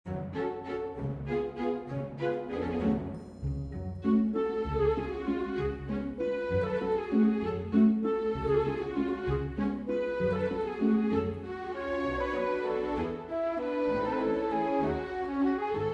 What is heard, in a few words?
Waltz in F Minor